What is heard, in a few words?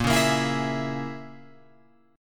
Bb Minor 7th Flat 5th, Guitar, Open F; Bbm7b5 strummed